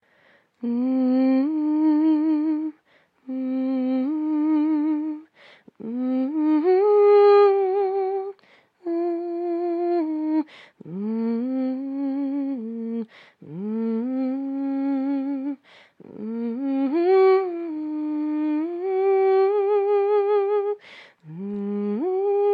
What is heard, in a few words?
Lullaby Hum; silent hill homecoming 2008 gamerip pc ps3 x360